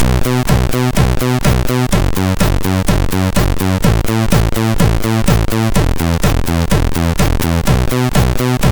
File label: Bassis, MOS6581R3; sid